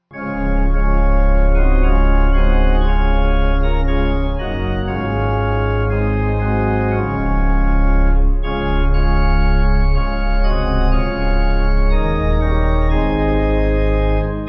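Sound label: Pipe organ, church music backing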